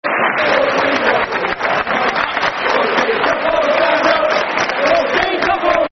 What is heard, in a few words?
Football Chants (Soccer Songs); Stand Up For Steve Coppell; Reading Rfc